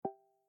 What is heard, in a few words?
HAPTIC SEC FACEUNLOCK SUCCESS